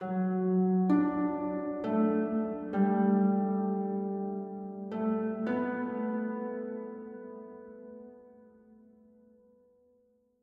Harp Low; gamerip; that dragon cancer

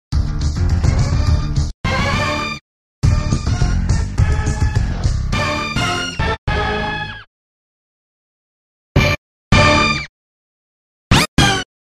Brass Stab; super mario world restored 2021; Yoshi's Sound Source = LEGO Island Brass Stab

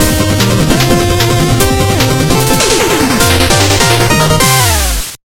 Music Arena; Shovel Knight King of Cards

